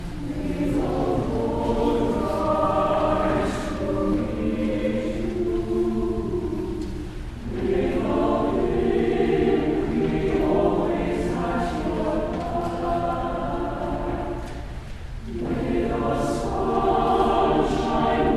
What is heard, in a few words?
Choral Irish Blessing